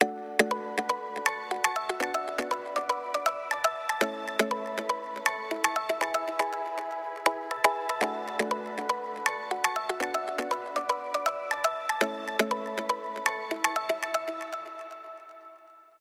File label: Relaxing Ringtone